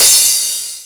drum hit finish

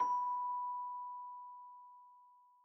Celesta High B

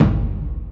normal hit whistle; osu

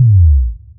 Bassdrop

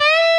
solo bend full

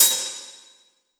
hihat

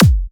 soft hit clap; osu

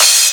cym

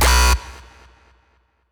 basstek

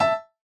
chorus chord A6 E6

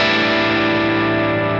A7sus4

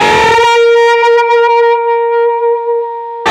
bend full Left-hand vibrato; osu

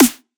soft hit clap

